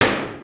shot; sniper assassin2

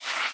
slide; match drop

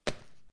fall; panda love

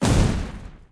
enemy explode; gunmach

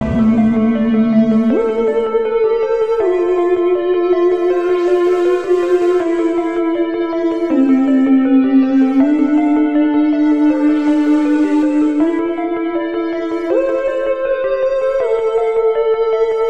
spooky 60s scifi loop; zombie mutation

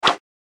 slash; slice fruit

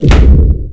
pop; kaboom

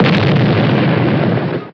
bomb; drawing space

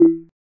create fail; draw the path

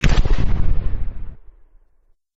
explosion; save the monsters

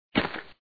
landing; stack jump

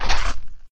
attack; killer io

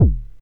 hit; desert run

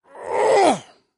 grunt; agent gun

